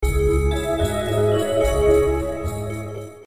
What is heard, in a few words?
game Over; JumpinJack